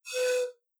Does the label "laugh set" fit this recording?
No